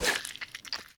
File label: Enemy Death Sword